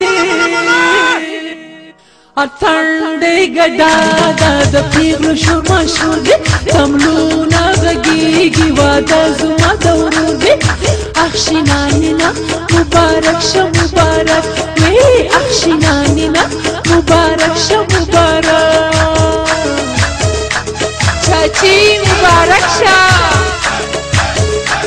Pashto

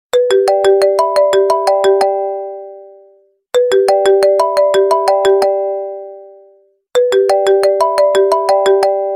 apple message, ringtones

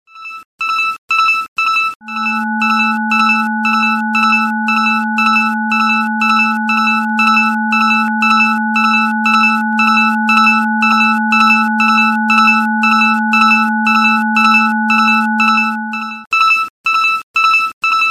Clock Alarm

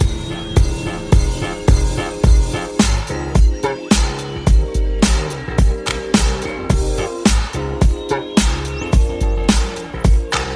HipHop

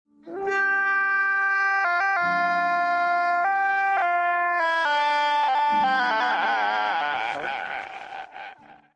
best cry ever auto tuned

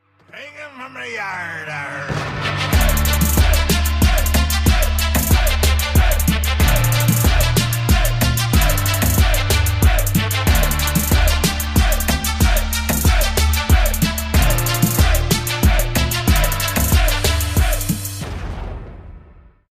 Caribbean TRAP

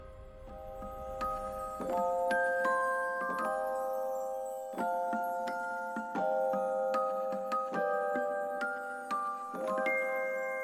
Musicbox

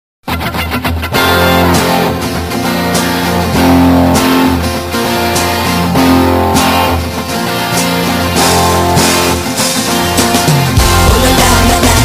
electrical guitar

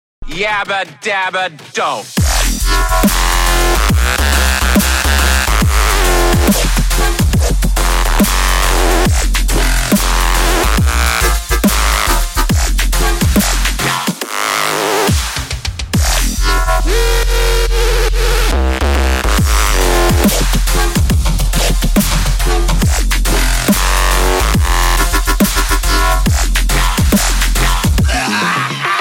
Dubstep

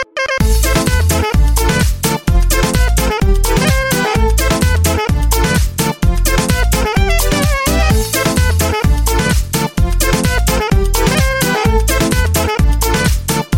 Balkan House

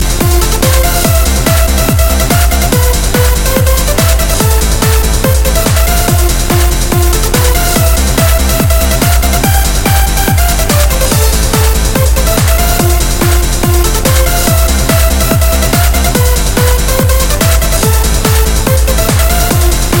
The Bass Is RAW

